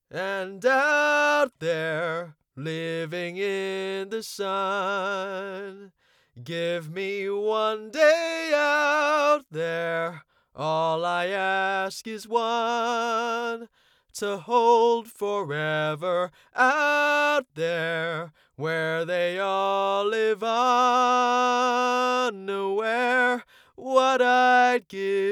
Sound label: Singing Sample; singer